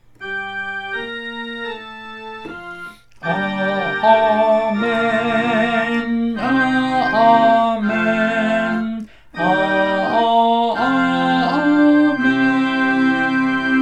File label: Amen tenor; ca doan teresa